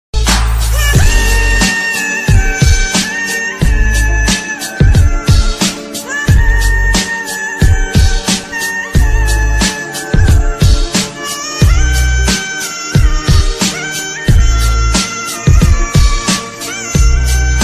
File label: Very Sad Instrumental Ringtones